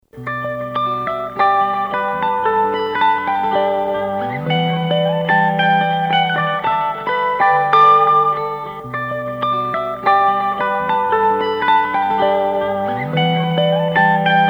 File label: music box